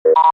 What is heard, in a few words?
Computer Beep: Indicating Activity; Beeps; Multimedia